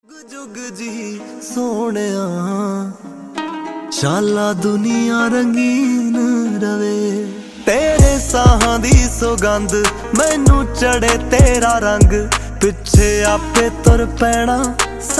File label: Ringtone